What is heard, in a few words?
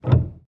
piano release; samples-piano